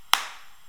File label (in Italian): Risposta impulso MLS